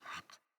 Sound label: Puppy Idle Pant Short; Enemies; Vocals; Kubrow